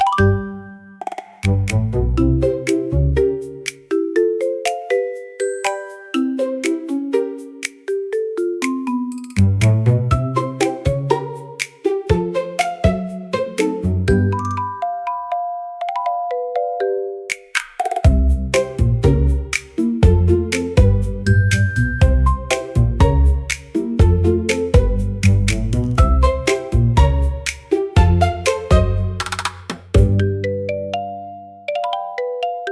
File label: Marimba Mischief, comical marimba for funny scenes